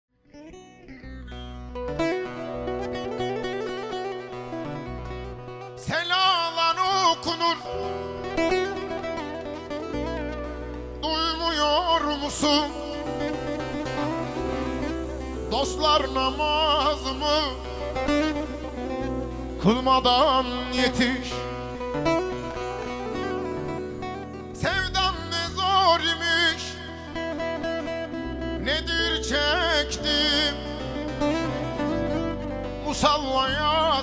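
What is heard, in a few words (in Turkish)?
canlı performans super slow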